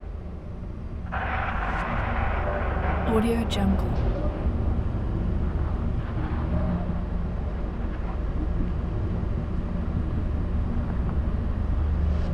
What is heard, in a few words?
abstract ambiences